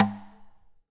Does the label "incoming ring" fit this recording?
No